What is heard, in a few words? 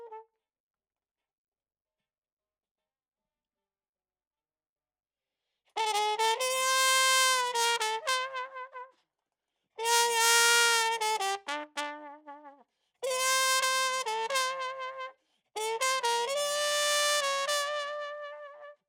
Trump; RE20